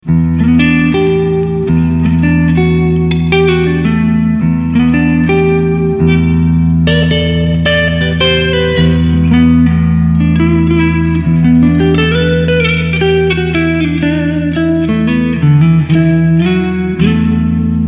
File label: Reverb FULL